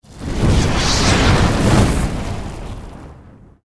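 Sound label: spell magician armageddon cast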